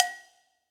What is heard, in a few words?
Cowbell Mid Studio Basic; Drums; Drum Hits; Standard Hits; Ableton; Session Drums Studio